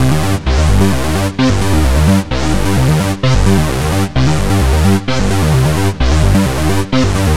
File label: 130 Cm synth, Synth Loops, Trance